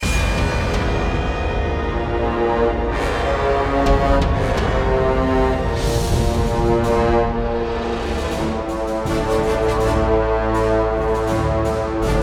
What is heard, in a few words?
Music Fight